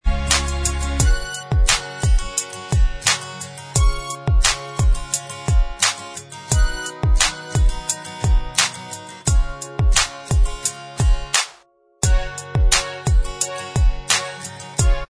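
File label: instrumental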